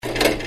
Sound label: Lock Low